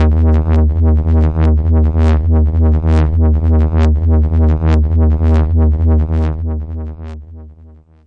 overloaded D sharp 2, Overloaded Unstable Synth Bass